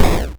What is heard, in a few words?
hit deep